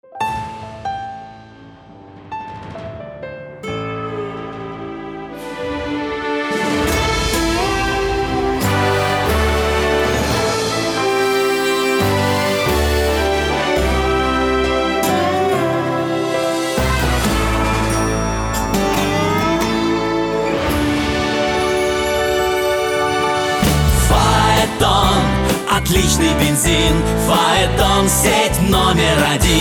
jingle; faeton; pafos

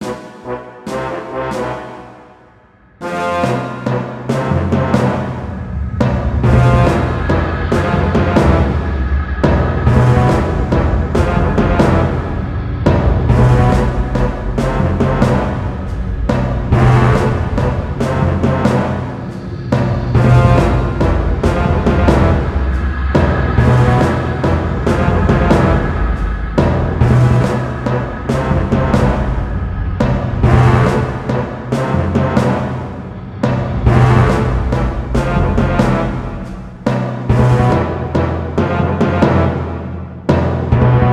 Battle loop

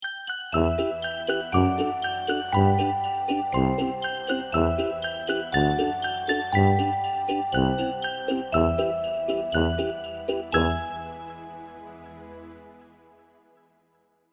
Sad Theme; Polar Panic

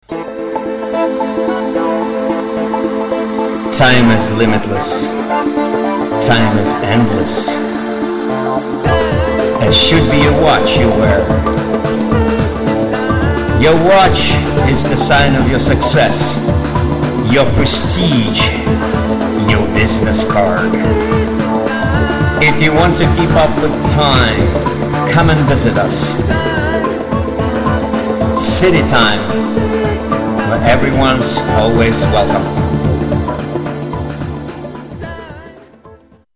City Time Jingle

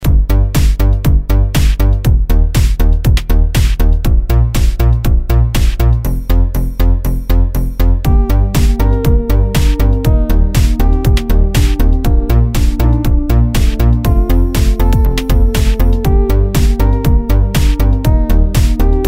Disco Style

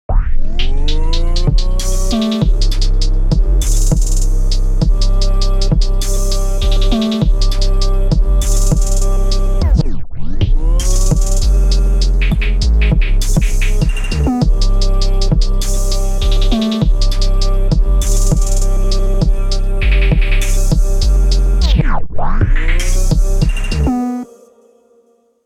Trap

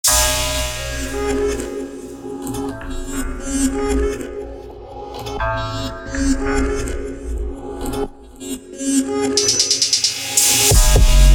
Trap

